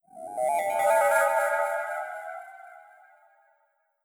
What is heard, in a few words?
Satyr Flute Spell